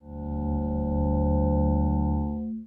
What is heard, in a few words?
bass resyn